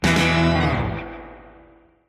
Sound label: Stingers; Disney Infinity